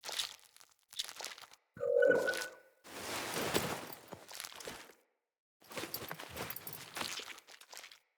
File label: Handoff Foley; Callstone